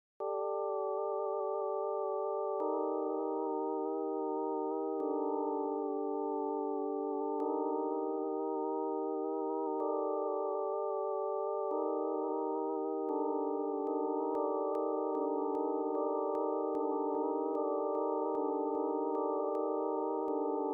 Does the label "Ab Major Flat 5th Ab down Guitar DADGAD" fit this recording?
No